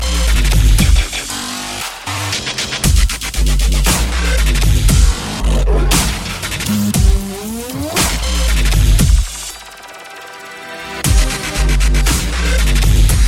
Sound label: sickest dubstep beat